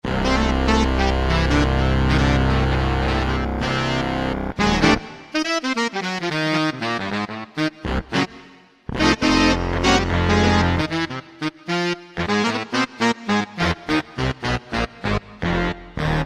Alesis QS8, Saxes; ThoseSaxes; Factory Preset examples

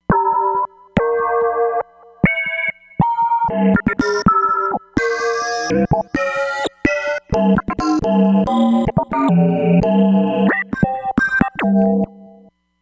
Alien Bubble, Roland Fantom XR

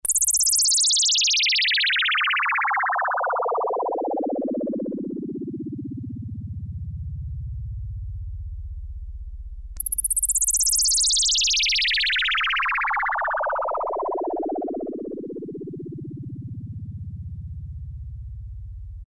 Sound Fall, Korg PolySix; Factory Presets Bank A

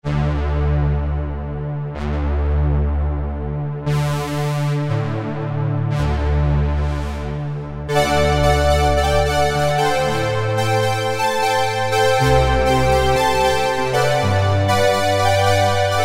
Strings; Cheetah MS6

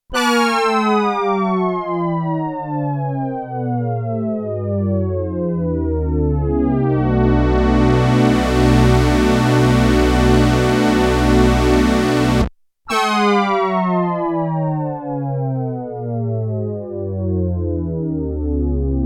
GLIDE BRASS II, Korg DW-8000; Factory Patches

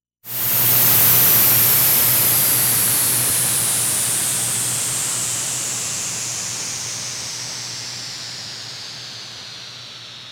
Jet

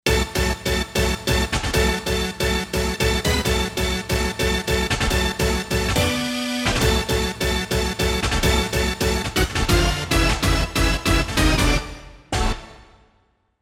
Techno Hit; Roland SC-880; Factory Patches

